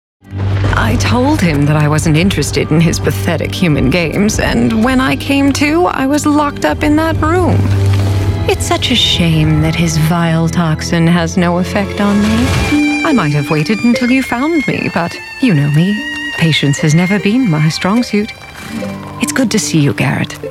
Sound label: Video Game